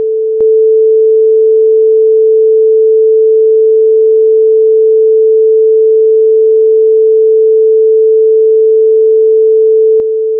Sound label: circle 440.2 time shift; oscope; lissajous